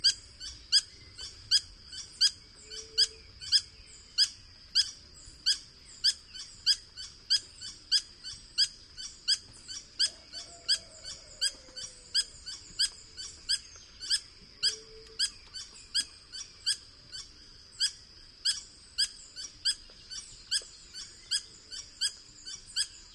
Phaethornis guy Ermitano verde

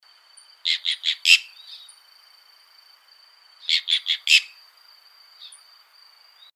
Chara Querrequerre Cyanocorax yncas

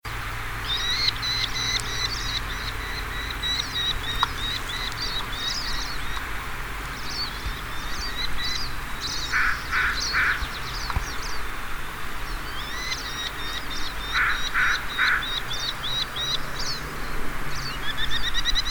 Esmerejón Falco columbarius suckleyi

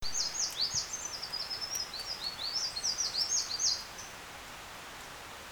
Candelita Adornada Myioborus ornatus

